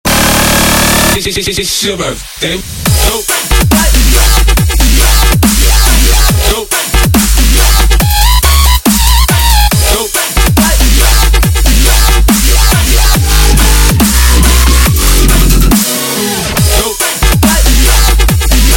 dubstep ringtone

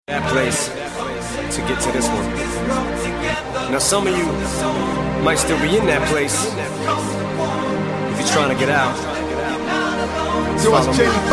afraid rap music; hip hop

